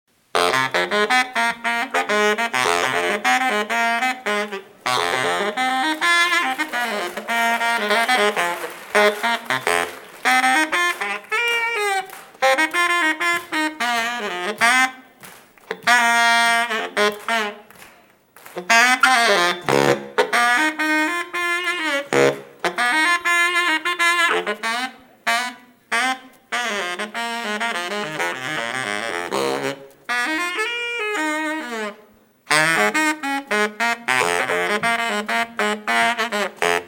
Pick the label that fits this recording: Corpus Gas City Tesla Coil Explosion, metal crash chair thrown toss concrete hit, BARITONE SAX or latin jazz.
BARITONE SAX